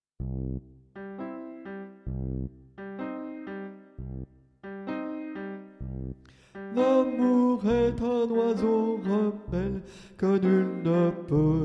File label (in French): habanera alto, chants